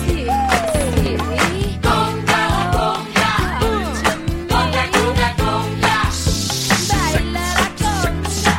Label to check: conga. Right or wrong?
Right